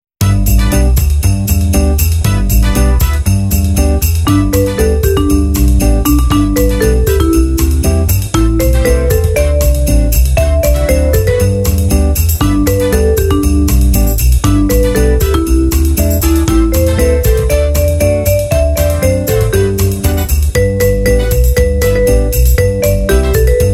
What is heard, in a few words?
Jingle Bells